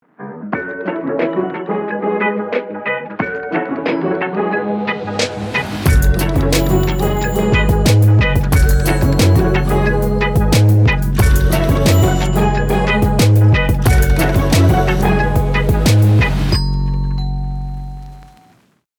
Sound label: FEELGOOD